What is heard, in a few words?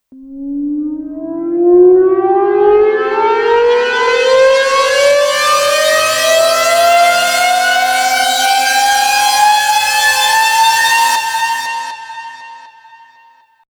Startup; Pro-12